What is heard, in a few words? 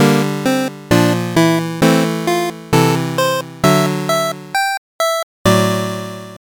gameover